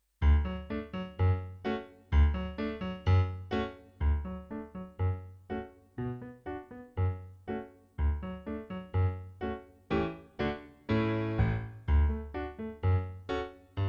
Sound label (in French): voici backing